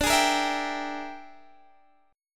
E 7th Sharp 9th Flat 5th D, chord

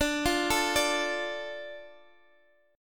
D Minor, Guitar, Open D

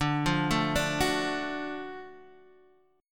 Gb Minor Major 7th Sharp 5th, chord

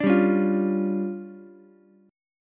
D Minor Major 7th Double Flat 5th, D, Ukulele; DmM7bb5; strummed; JGuitar

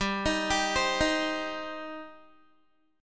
G Suspended 4th Sharp 5th G down Guitar; Gsus4#5 arpeggiated

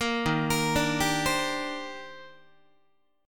D sharp Major 13th, down, Guitar; arpeggiated; JGuitar

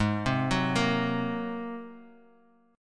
Bb 7th Suspended 2nd Sharp 5th Ab

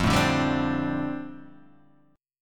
F 6th Add 9th Guitar Open G, chord

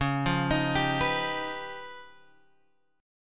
Db 9th Flat 5th, Guitar, Open G

